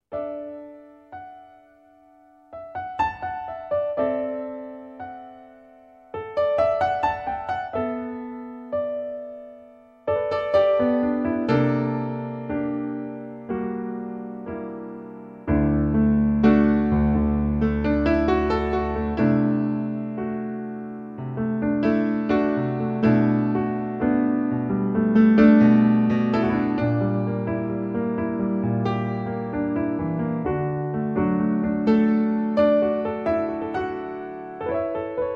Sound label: Concert Piano